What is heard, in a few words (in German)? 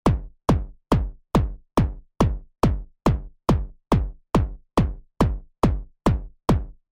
Kobol Bassdrum invertierte Huellkurvensumme